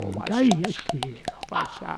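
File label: Music Village Calm Inside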